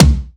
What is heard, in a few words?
high urban tom sound c key